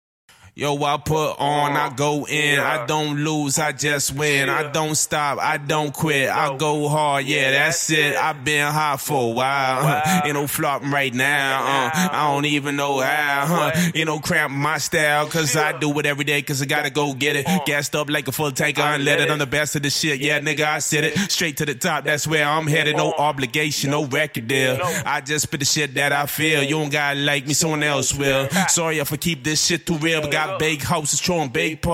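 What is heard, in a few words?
hip hop 130 Dm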